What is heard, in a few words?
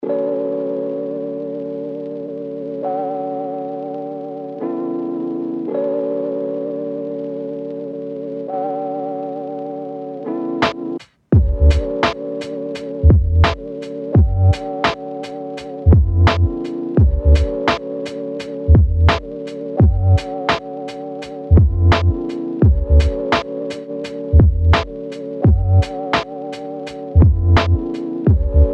noise